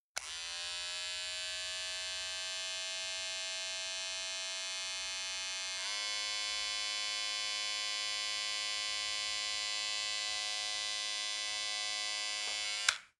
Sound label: Electric Hair Clipper